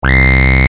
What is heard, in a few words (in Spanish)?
incorrecto; historia